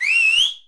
WHISTLE, SMALL CHEER; 1000fx

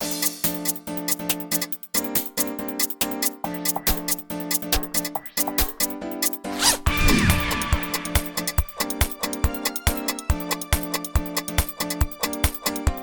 Plastic Bags, music beds

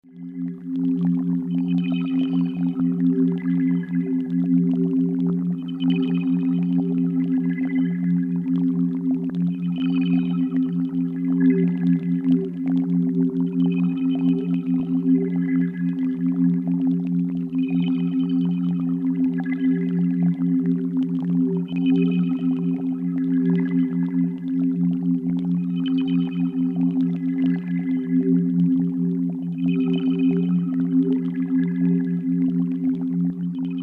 Click Backdrop; Beds

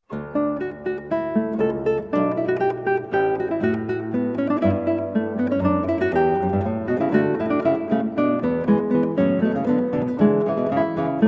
Allegro from Trio Sonata in D Major, Guitar

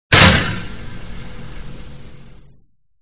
Stapeler; SOUND EFFECTS1

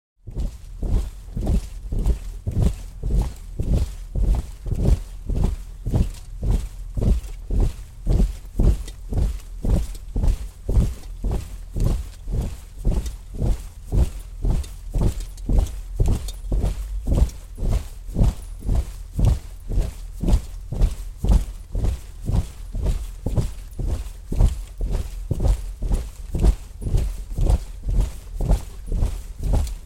Infantry March Constant 200 Men; Historical; Hollywood Edge Historical Series; War Horses Armies & Weapons

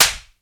SLAP, FIGHT; 1000fx

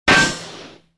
Bullet Bounces Off From Heavy Metal Plate; Crashes Impacts

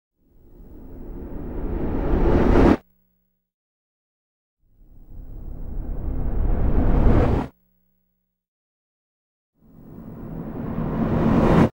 Reverse Stinger - Parachute Opening x3; Hollywood Edge Sound Designers Tool Kit

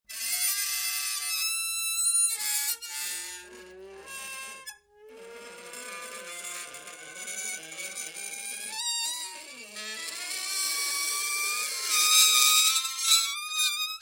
Squeaky gate; SOUND EFFECTS1

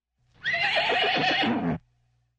Horse Whinnies; SOUND EFFECTS1

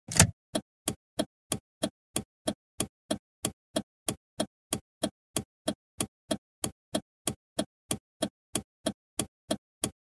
Volkswagen GTI Turn Signal On Off; Cars

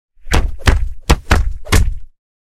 Kick-Block Combo; Hollywood Edge Martial Arts and Human Impacts

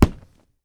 PUNCH A, FIGHT; 1000fx

